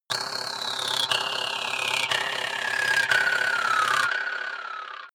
sci-fi flutter; soundpool

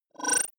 disappear flicker short, signale; soundpool

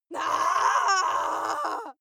female lunatic scream pain long; schreie; menschen

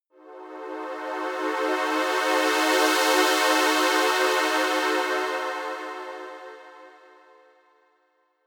saw pad swell

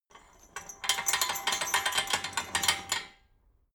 metal chain rattle short

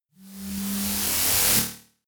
noise sizzle short; risers

